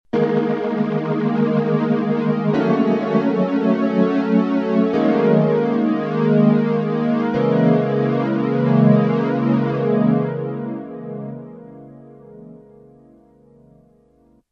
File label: m1000 cls222